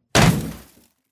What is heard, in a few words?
Small Grenade Explosion; بمب و نارنجک